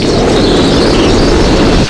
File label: Raising block; Playable Tutorial Level; TR1